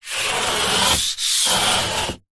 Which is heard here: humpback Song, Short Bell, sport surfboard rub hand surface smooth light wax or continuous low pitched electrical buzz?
sport surfboard rub hand surface smooth light wax